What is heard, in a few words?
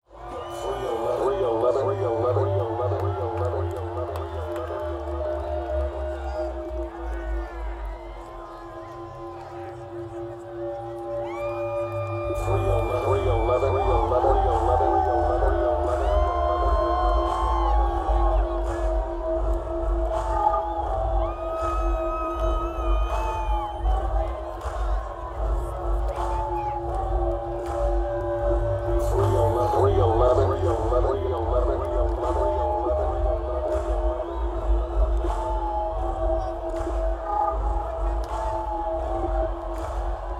Bass Intro